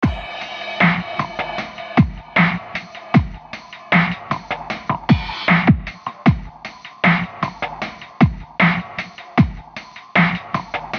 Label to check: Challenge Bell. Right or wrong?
Wrong